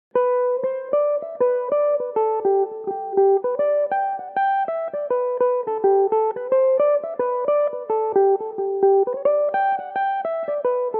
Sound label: drake revisited jig g major, mandolin; Nell Flaherty's Drake; Belmuse EM-190 electric mandolin